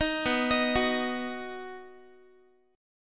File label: B Major, Guitar, 4A,4E,5A,5D,5G sharp,6C sharp; arpeggiated; 18,19,18,16,x,x